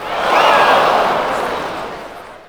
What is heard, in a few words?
OOH; Grand Theft Auto Vice City; XBOX